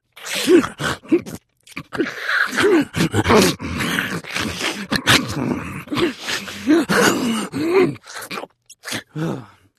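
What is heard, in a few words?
zombie sound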